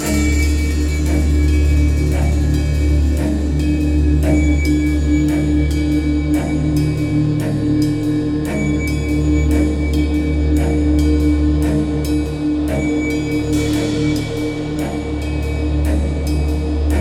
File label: Suspense, music; Movie